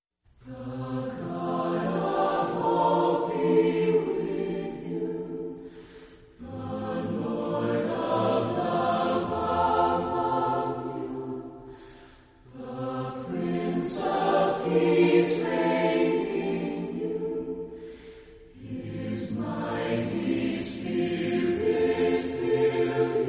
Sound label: Chorale, Voices in Praise acappella